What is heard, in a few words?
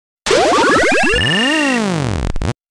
unlock button